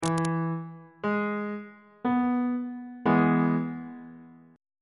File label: d double sharp major chord